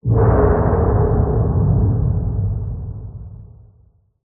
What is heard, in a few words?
impact underwater or space crash distant; lukas tvrdon